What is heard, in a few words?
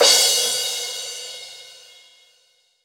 Crash, Cymbals; Roland BK-5